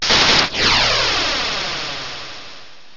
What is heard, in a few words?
Brutal Swing; pokerogue; battle anims